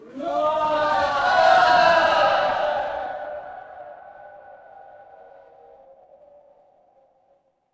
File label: crowd cheer